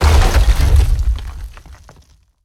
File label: Rock Prison Formation; Evolve; Behemoth